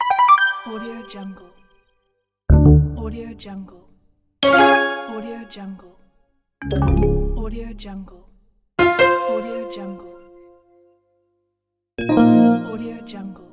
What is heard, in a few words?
phone os gui fx